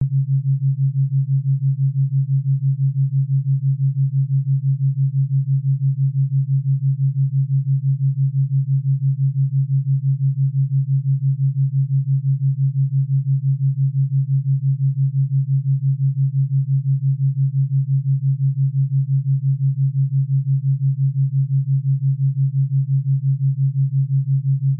high theta, Binaural; JetCityOrange

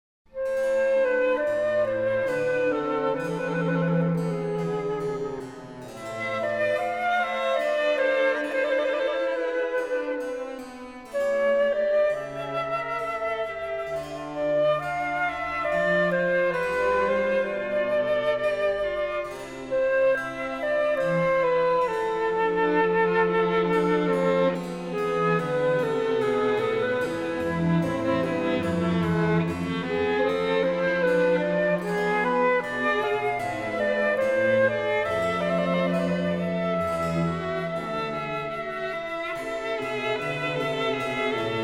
Flute Concerto In C major; Bach

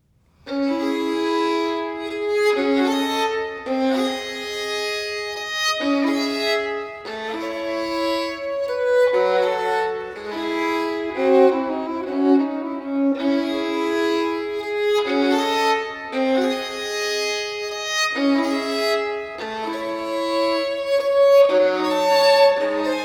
Partita in D Minor, Violin Solo; Partita nr.2; BWV 1004; Bach; Sonatas & Partitas for Violin Solo

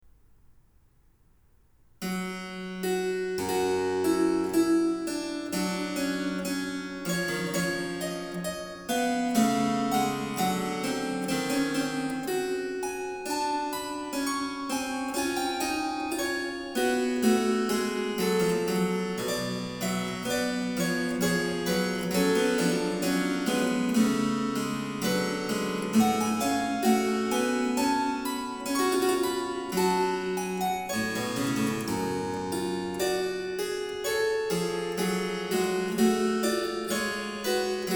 Canone alla Quinta, Harpsichord; Bach, J S; Goldberg Variations; BWV 988

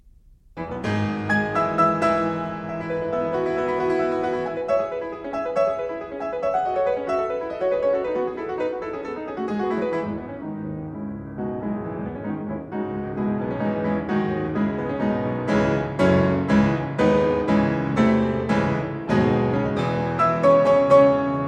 Prelude in E major, piano; 24 Preludes

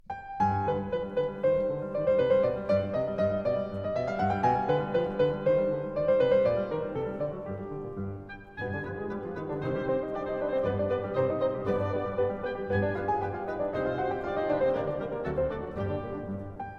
Piano Concerto No. 3 in C Minor; Op. 37; Beethoven

